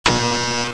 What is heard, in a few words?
Zap Buzzer